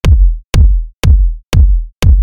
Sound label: EDM; Dropkick; preset Low Blow